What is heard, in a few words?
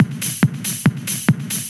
Loop 140 BPM; Vengeance Samples